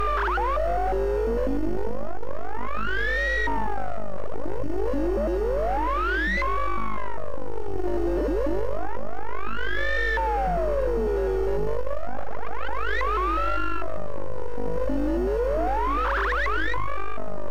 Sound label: Alien Ambiance